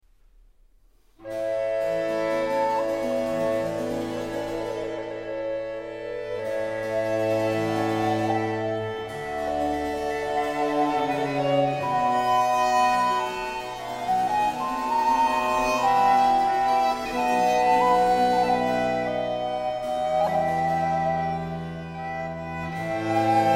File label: Trio sonata in G, recorders; Keller; Movement 3; Oblinger/Ose Keller; Alto